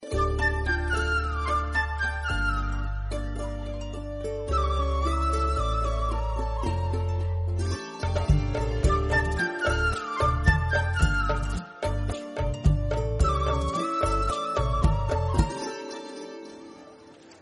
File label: flute